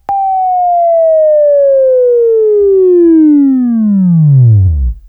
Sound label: WAV Left, Sweeping; Labview-Program; Sweeping Training program